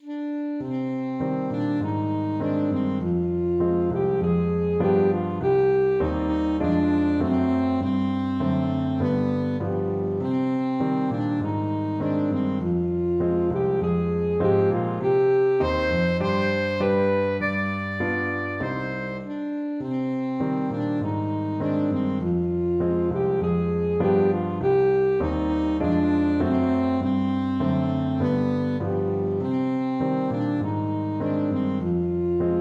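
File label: back to the hills, alto saxophone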